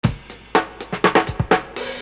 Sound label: Beat, Hip-Hop Street Beats